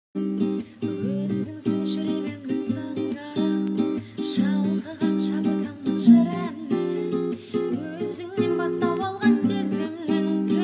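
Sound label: guitar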